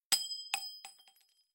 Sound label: shells 20mm bolt; guns; tanks; War Thunder